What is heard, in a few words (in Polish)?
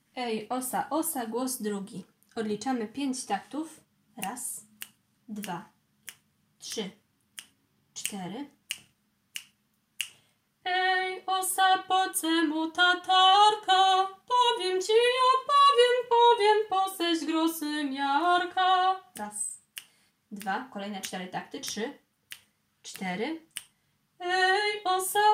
ej osa osa głos